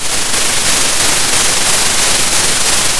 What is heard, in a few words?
Sound of a thunderstorm